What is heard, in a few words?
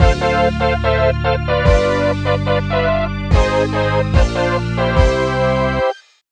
Game Over Win